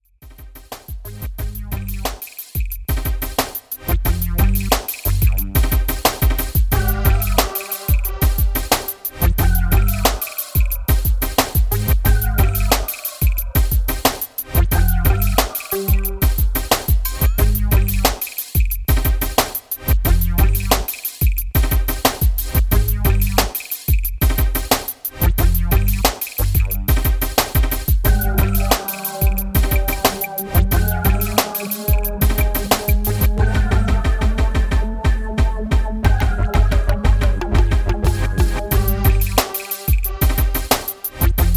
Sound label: instrumentals